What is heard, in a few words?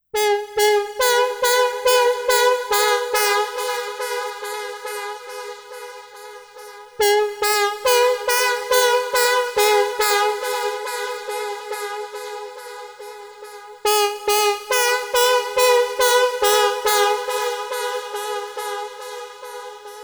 140BPM G#; Vengeance - Essential Clubsounds Vol.4